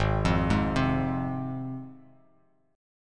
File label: Ab 6th down Bass Standard; arpeggiated; JGuitar